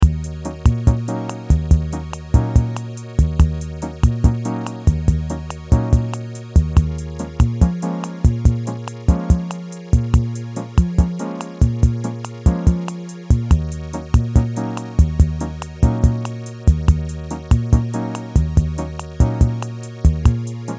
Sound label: Relaxing quiz thinking time music; The Filmy Food Quiz